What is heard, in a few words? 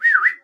cowboy whistle